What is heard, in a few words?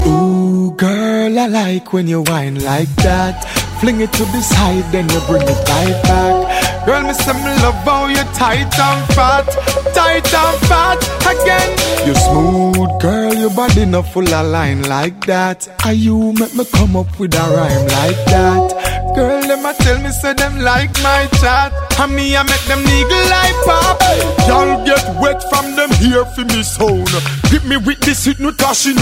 busy signal wine